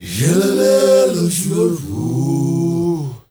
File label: MALE, CHOIRS; MALE GRP; Spectrasonics; Heart of Africa 2